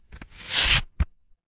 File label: paper fabric tear